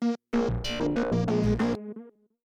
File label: 95bpm Lead only End; bakugan champions of vestroia 2020; switch gamerip